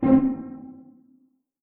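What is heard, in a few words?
string pizzicato C3 pianissimo right; Sound On Sound - Peter Siedleczek's Orchestra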